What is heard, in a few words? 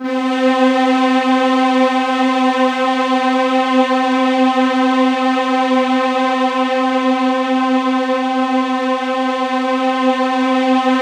C4, Synth Leads